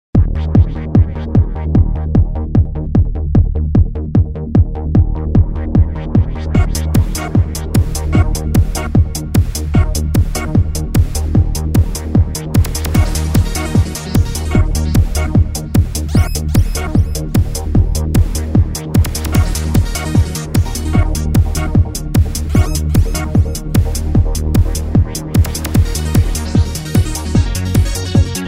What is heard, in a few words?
arp bells trance